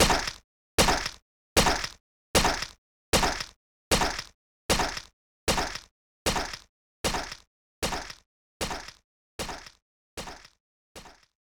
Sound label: swords; magicians and looters; xbox 360